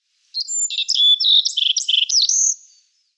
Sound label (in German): Maskenammer Emberiza spodocephala